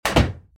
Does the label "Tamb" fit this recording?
No